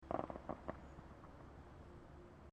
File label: Fireworks Small Display Crackles; Backgrounds; CraftedSignals